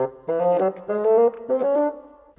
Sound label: Bassoon, Orchestral; Sonic Foundry (Sony Creative Software); Orchestral VOL-2; Modern 18